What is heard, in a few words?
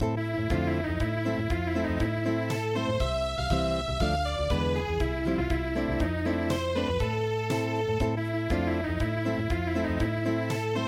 Unplugged; daichi ni hana ga saite iru